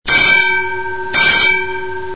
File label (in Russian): 2 zvonka